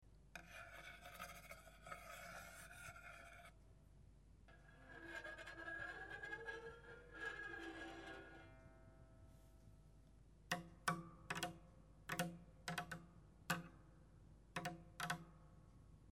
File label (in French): baguet en bois